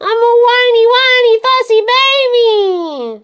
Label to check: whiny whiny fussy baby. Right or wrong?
Right